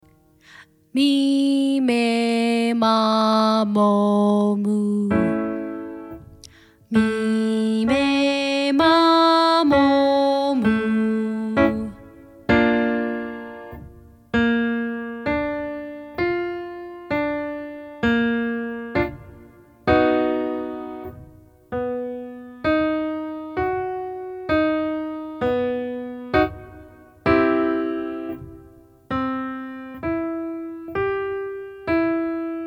me may mah high range, vocal exercises

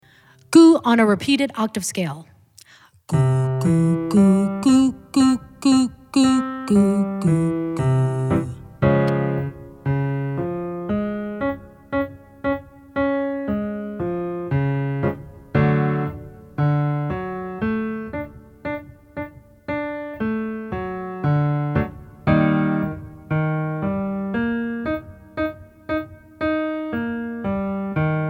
goo repeated octaves low range, vocal exercises

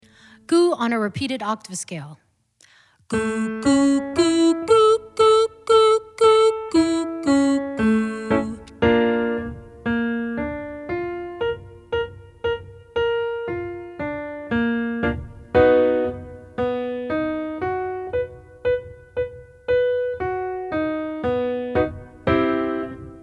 goo repeated octaves high range, vocal exercises

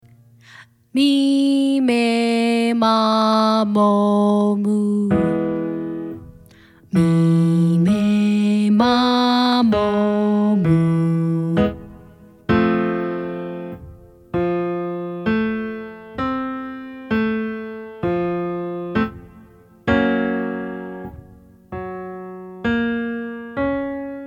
me may mah middle range, vocal exercises

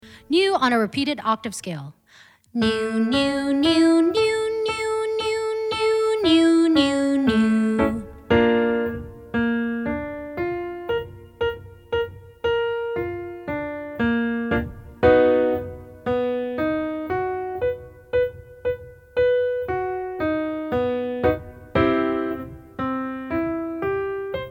nu repeated octaves high range, vocal exercises

